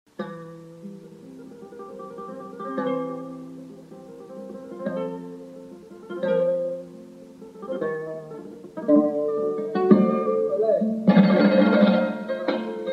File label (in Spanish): Fandangos